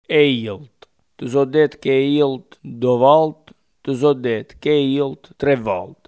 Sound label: alto